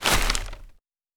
Leaves

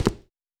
Dress shoe

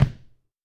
Sneaker

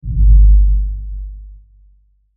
Sub Impact

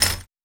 Glass

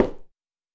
High Heel

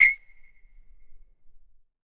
Basketball Court

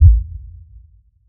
Sub Impact

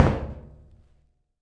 Metal

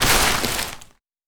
Forest

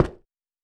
Cement

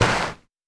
Snow

